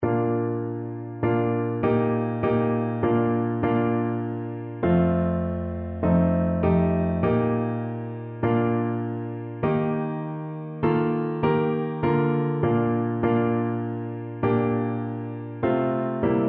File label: up from the grave piano